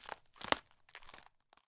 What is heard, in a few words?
Root